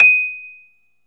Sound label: Rhodes 73 Slow, D#; E-MU Producer Series Vol. 5 – 3-D Audio Collection; 3DRhodes